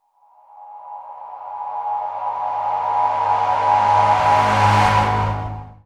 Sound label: Trance Sweeps; FX; Vengeance Samples